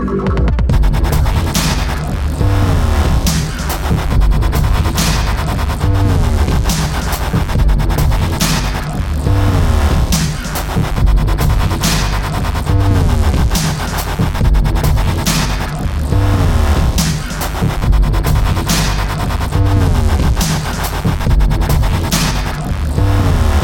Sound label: Rave